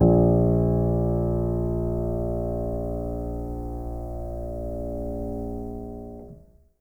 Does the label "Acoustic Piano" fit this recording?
Yes